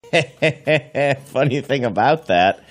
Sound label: heh heh heh